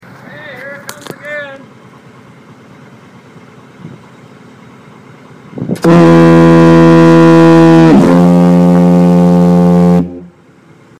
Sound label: east brother light diaphone foghorn